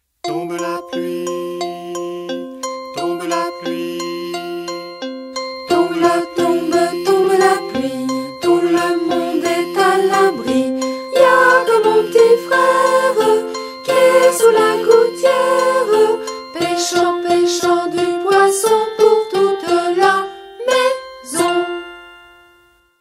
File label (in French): tombe la pluie